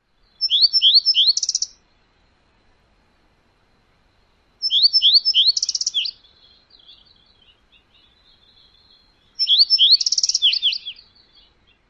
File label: songs